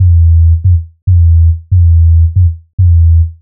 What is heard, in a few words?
140 E BASSLINE, Dubstep; Loopmasters; The New Sound Of Dubstep; BASS LOOPS